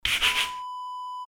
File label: scrubbing surface